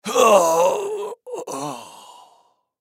Human Grunt; Grunt Long Die Last Breathe Element Male 25 Yrs; Blastwave

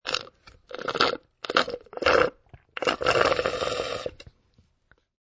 Drink Straw

